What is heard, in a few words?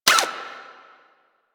Necramech Repair Drone Idle Short; Warframe